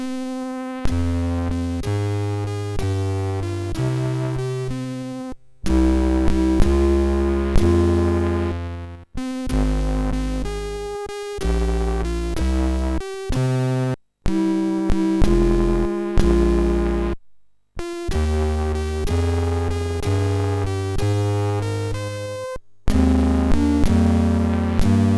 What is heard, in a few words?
Fyllepolska, sid MOS6581R3; Fredrik